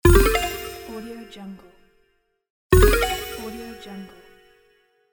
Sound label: kids game win